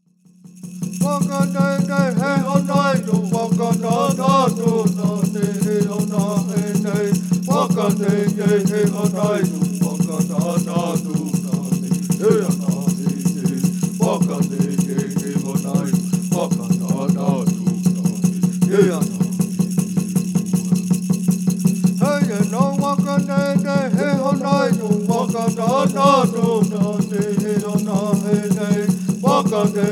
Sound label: Ceremonial Song